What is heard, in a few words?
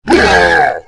Growl